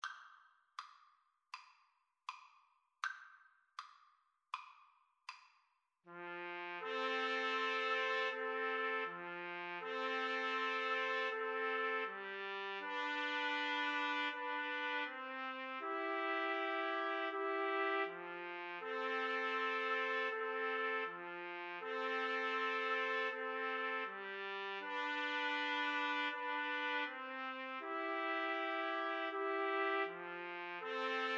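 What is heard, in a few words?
trumpet, karaoke; Trumpet Trio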